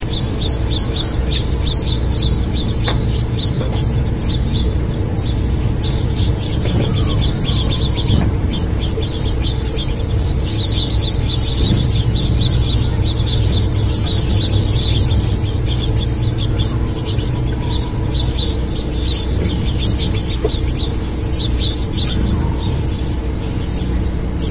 finch

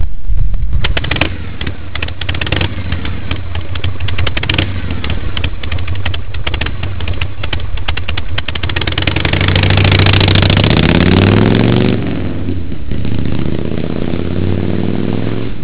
shovel; Shovelhead; Harley

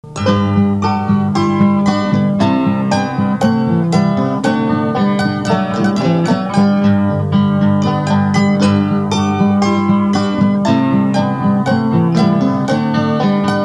music box

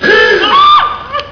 HORN; The Birdcage

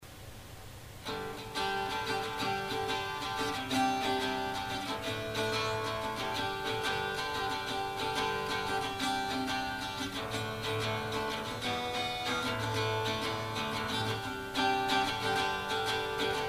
guitar

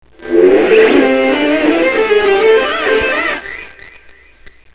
riff

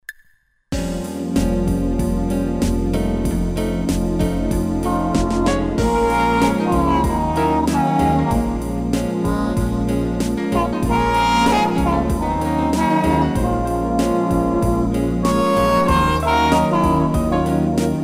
Harp

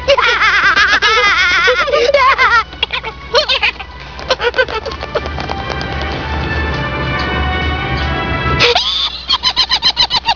Laughing

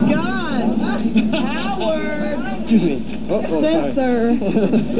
censor

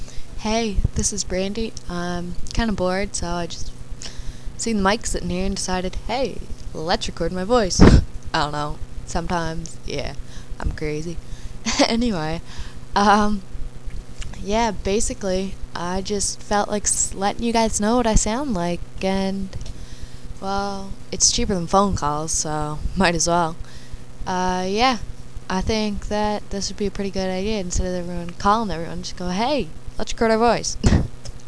bahaha

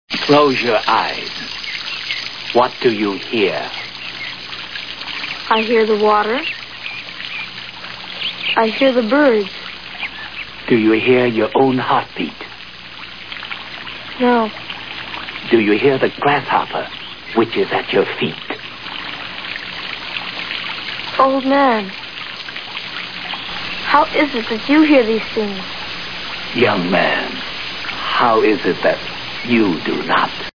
grasshop

